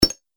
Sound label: Robotic Menu Click; Robot Sound Effects